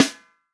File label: FUNK SNARE CHROMATIC, REAL DRUMS